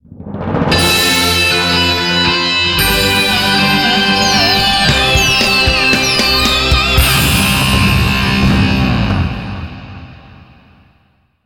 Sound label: Champ win; Table Top Racing